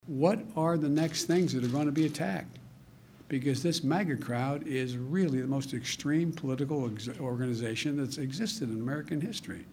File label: maga crowd; president biden scotus reax